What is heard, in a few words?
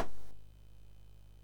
sid MOS 6581R2